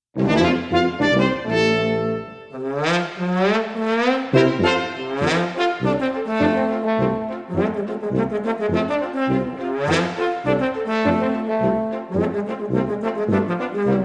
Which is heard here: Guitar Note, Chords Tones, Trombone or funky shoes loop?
Trombone